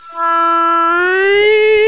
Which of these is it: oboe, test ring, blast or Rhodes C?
oboe